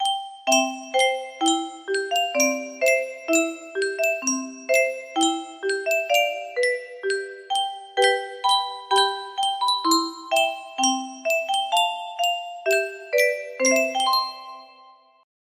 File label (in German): yunsheng spieluhr